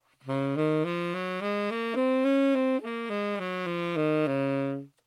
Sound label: Db major scale, saxophone